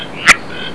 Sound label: agalychnis, anfibi